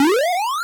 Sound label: Special Photo Powerup Platformer Jump